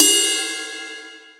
big ride cymbal sound clip f key, Royality free ride cymbal sound tuned to the F note.